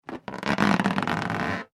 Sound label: balloon rubbing squeak; Balloon Being Rubbed With Hands - Good Cartoon Stretching / Expanding Sound; Funny Sounds; Comedy; McKinney Sound